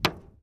Plane P40 Step On Wing; Airplanes

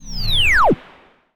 Player Powering Down; Space Wave Race

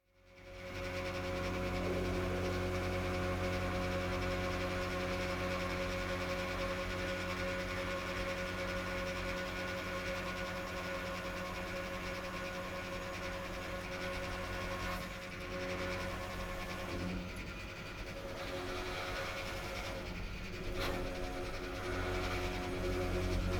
Strange machine; KMP Film Video Sound Effects

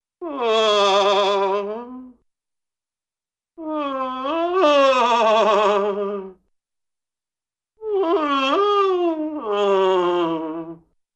Funny Moaning Groans, Cartoon; Hollywood Edge; Cartoon Trax

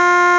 Car Horn Loop; Automobiles

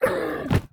Undead Abbot Fast Attack; Blind Quest - The Frost Demon

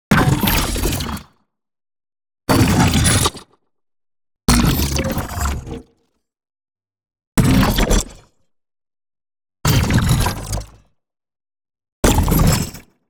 NEON ZAP Plasma Injection; boom library

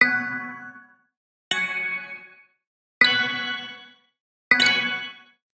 Digital Click Positive

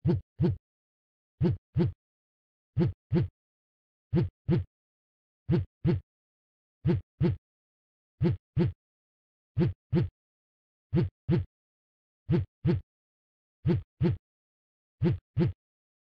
phone vib heart; devices accessories utilities; NS Studios - ultra sound collection

